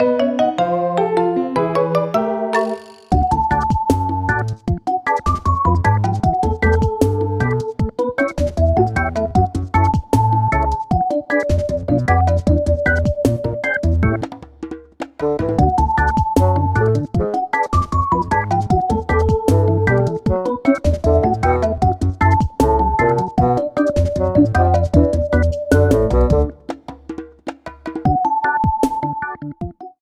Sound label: REGGAE